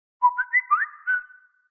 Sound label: Whatsapp Samsung